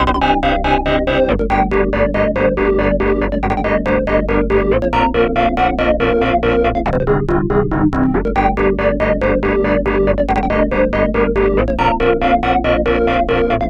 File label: 140bpm, Instrumental Loops; Crowdsourced Sample Pack; TRUTH-HZ